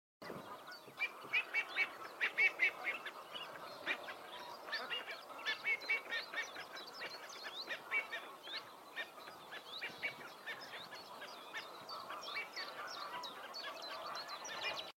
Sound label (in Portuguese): canto do zarro comum